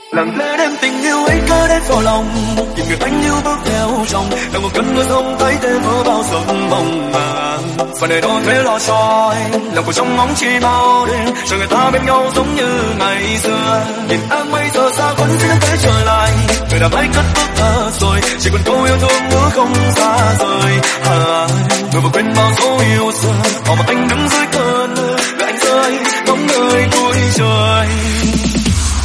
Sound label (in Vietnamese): Nhac Chuong Remix